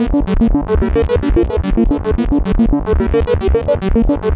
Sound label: Synth Arpeggio, Synths; Eclectic Electronica